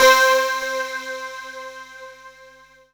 TS 10, Synthesizers

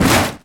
Racing Airplanes; Rocketeer

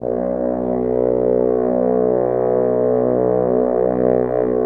French Horns

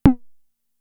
Tom, Toms; Fricke Schlagzwerg; Drum Machines

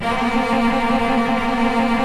CELLI M TRLL; OMI - Universe of Sounds

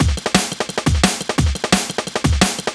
DNB BREAK; breaks; m8 breaks

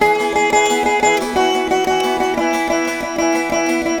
VEENA; Spectrasonics; Heart of Asia

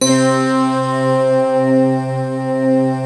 D50 Stak, Roland

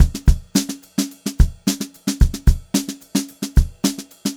GROOVY BEAT, Funk Groove Drumloops; USB Soundscan vol.38; AKAI

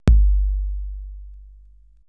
80S DRUM SET; Best Service ProSamples vol.55 - Retro Sampler; AKAI

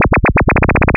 LFO, Noise

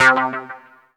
KIDS C4; Q Up Arts; Dream Experience; DANCE

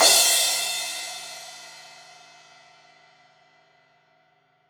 Crash 16in edge, Cymbals; Deeply Sampled Drums

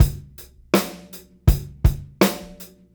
Beat Loops 081; Zero-G; Monster Beats

